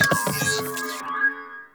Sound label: Hack UI Positive; Warframe; The New War; Narmer Hack